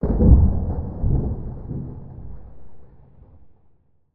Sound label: Distant Atmospheric; MatiasMacSD; INFILTRATION